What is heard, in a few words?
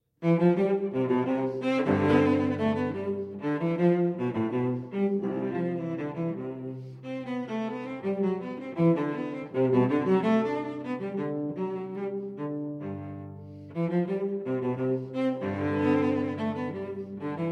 Cello Suite in C Minor Gavottes I & II; Cello Suite No. 5 in C Minor, BWV 1011